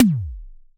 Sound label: TOM, Yamaha TX7 synth drums